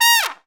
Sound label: FAST FALLS; E-MU Formula 4000 Series Vol. 5; Protozoa; Proteus1 Instrmt